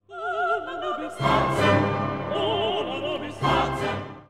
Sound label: Dona nobis pacem duet